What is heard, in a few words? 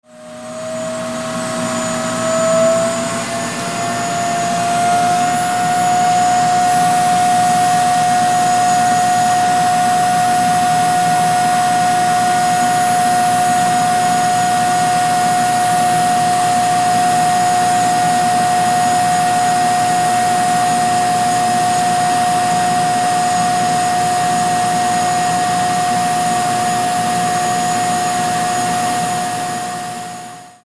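vacuum cleaner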